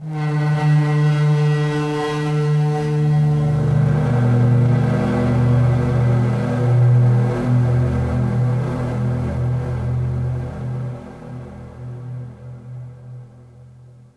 ice; DAoC

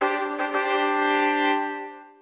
Trumpet

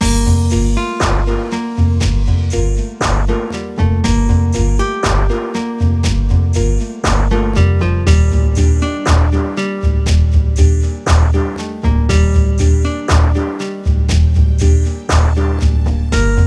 Exloop; Interactive Cosmos; Kosmos

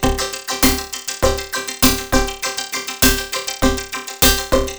Metallic Insectz, Drums And Beats, 100 Bpm; Electronic Garden

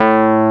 Wurlitzer Hd A2

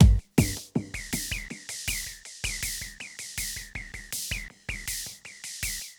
DRUM LOOP ambient 160

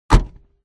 trunk; WMBonusXP

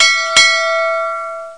BELLS; 5thfleet

